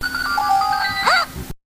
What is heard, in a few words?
Wiimote Sound; Zelda